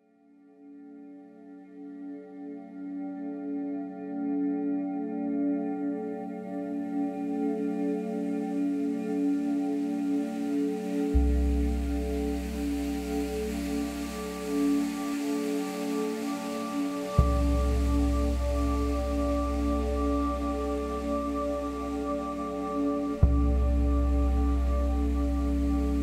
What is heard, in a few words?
shamanic drum journey koshi bells; tantra music for serenity and calm